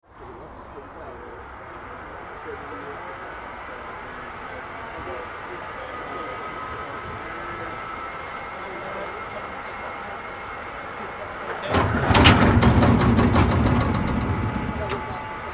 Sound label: Greyhound Dog Trap Sprung 2, Races crowd - hare wire start; Greyhound Dog Trap Sprung 2 - Races crowd - hare wire start then trap sprung open - Greyhound races; Sports